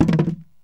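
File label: BOX RATTLE, Box Noises; E-MU Producer Series Vol. 3; Hollywood Sound Effects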